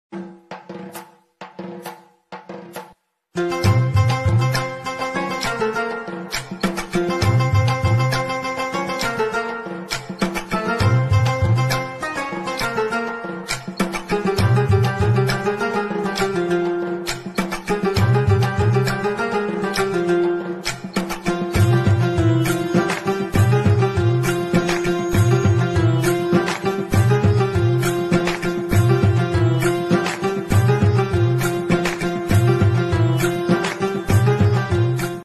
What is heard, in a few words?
fell the bgm surya son of krishnan, Telugu bgms ringtones